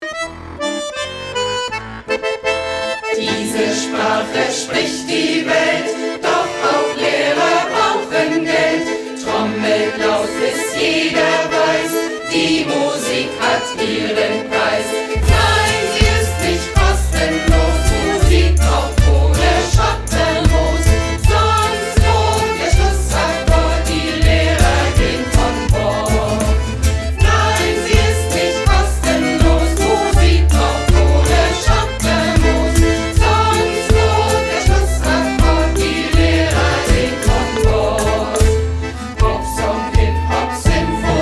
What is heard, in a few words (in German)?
Schlussakkord